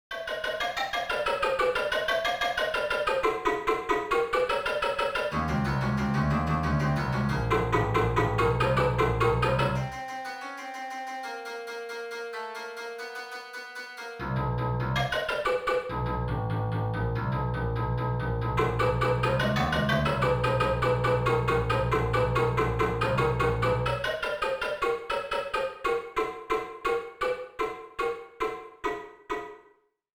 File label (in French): Boite a musique